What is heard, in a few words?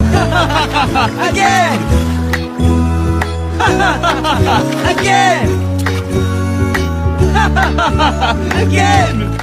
hahahahaha again; memes